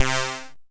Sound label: lead; Stereo